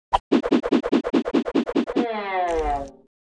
flap

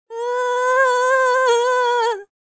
scared, Hippy

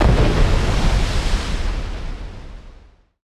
depth charge

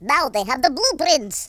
uhoh; Russki

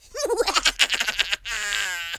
laugh; Horror

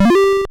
tada

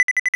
MINE ARM; Worms3D

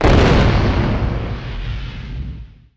oil drum explosion; Worms3D

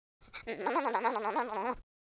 scared; Spanish Inquisition

